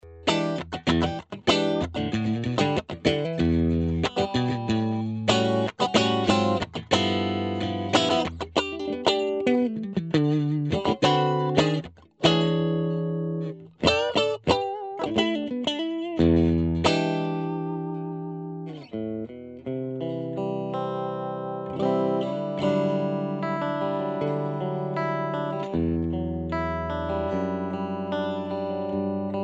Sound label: clean